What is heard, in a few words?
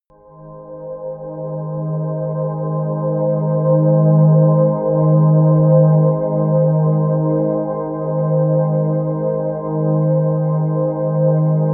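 angel air, pads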